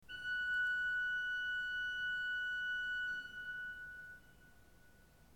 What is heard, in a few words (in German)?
ton, orgel